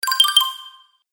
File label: Stage Complete Game Fx; Video Game Sound Effects